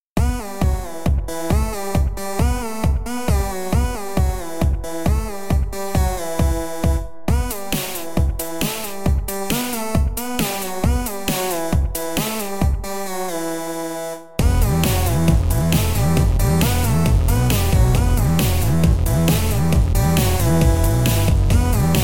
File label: Smooth Lead No Duo